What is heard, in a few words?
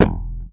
THUMP, FRTLSS, Bass; Sampleheads; Will Lee Bass Library; F JZZ